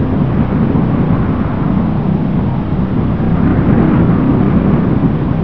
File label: wind; quake2